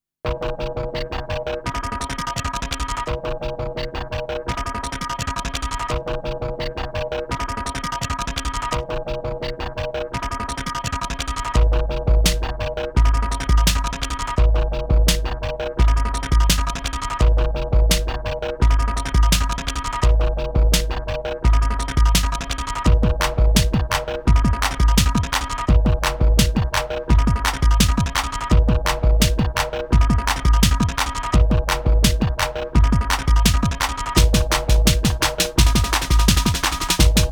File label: drone